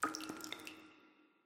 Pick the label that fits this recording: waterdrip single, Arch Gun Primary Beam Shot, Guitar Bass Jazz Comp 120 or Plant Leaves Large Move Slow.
waterdrip single